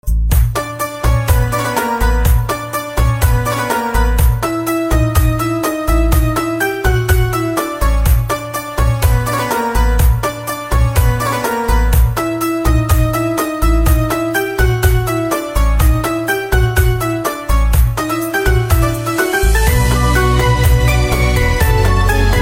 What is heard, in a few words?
Piano Instrumental Ringtone